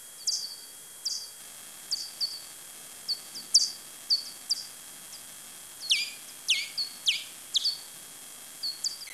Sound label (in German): Zwergfledermaus